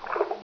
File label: tube footstep right